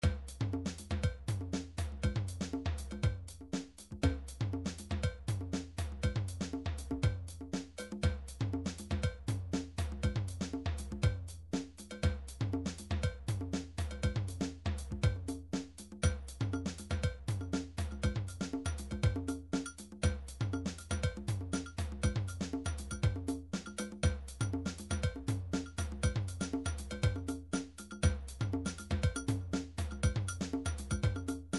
drums

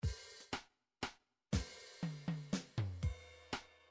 drums waltz

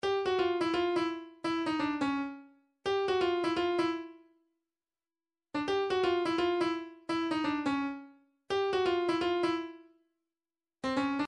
percussion midi files cowbells 085 agogos; Stylus

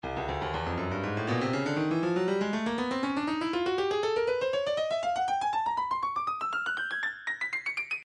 Percussion Bongos, midi; Stylus Percussion Midi Files Bongos 120

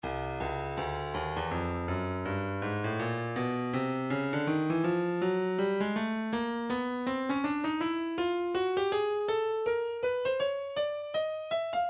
breakbeat midi 081 bpm; neck a; Stylus Breakbeat Midi Files

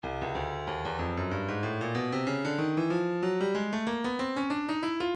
breakbeat 094 bpm; Stylus; Head Knod

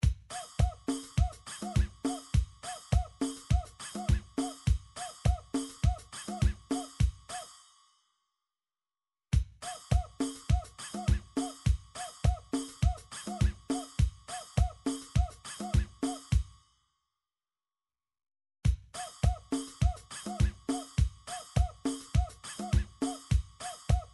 drum latinrap

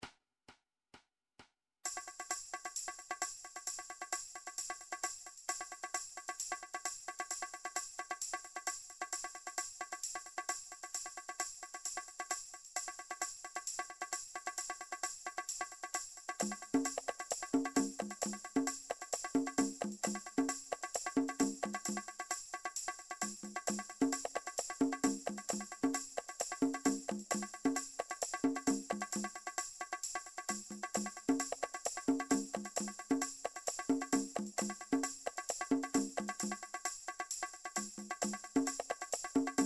drum trax per; Drumtrax Library 2

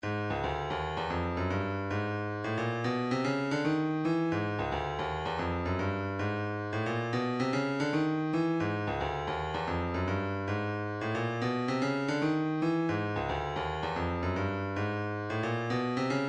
112 kick, midi

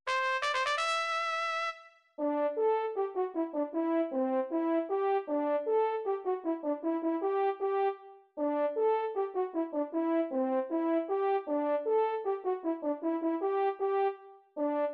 battle victory; super nintendo fire emblem 4 japan

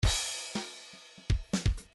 grooves funk; Midi File

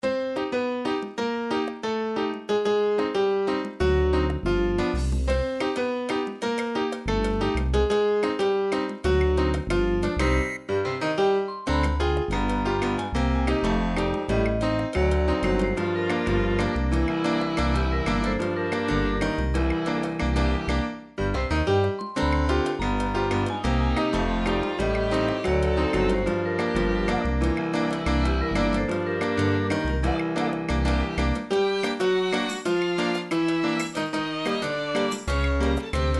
salsa, midi

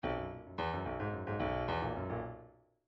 bdf midi file grooves funk a funk a

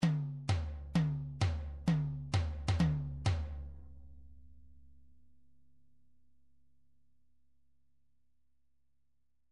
individual drums timpani, midi

